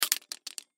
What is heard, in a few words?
Carabineer Large, Carabineer Drop; Drop Sound Effects; FOLEY